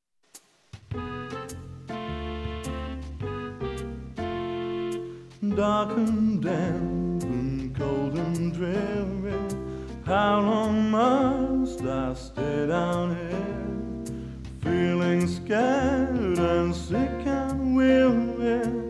Blues

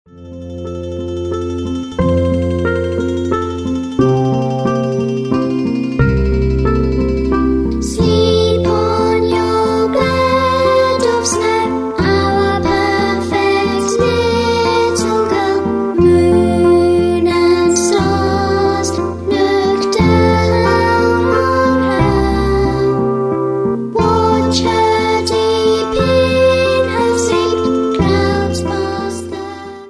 Lullaby

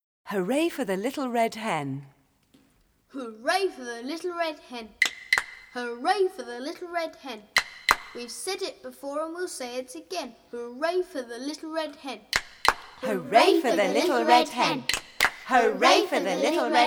Opening Chant

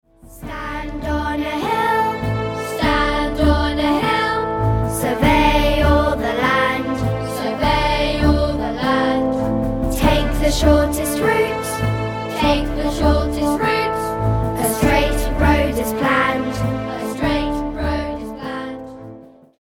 Road Building Song

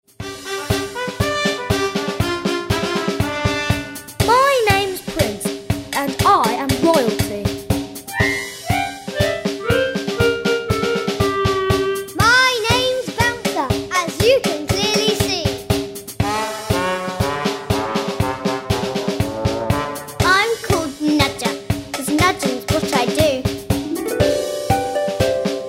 Hello Donkeys

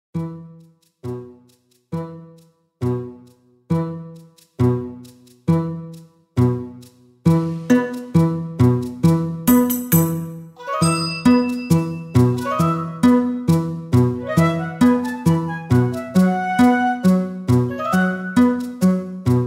Woodland Dance

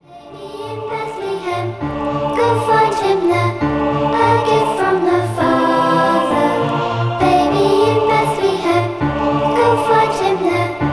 Choir Of Angels

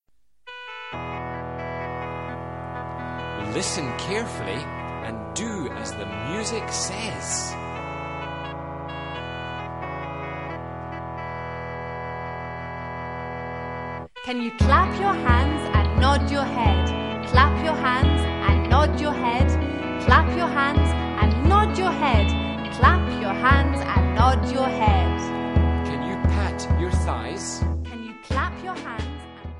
Action Song

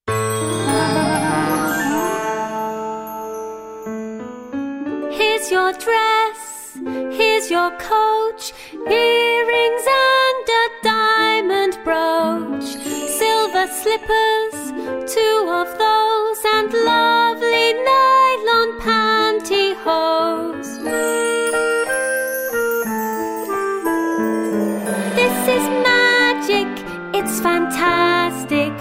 Transformation Waltz

